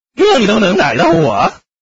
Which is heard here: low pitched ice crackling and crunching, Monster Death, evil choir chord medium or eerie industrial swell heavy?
Monster Death